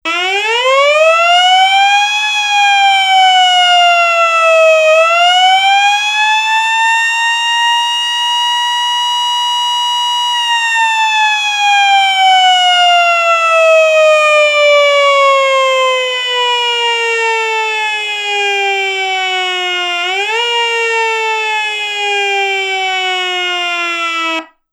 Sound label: manual mechanical, siren; sa441M